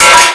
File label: Glass; RivalBall for Macintosh